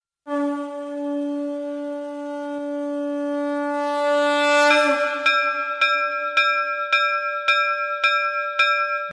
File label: Temple Bells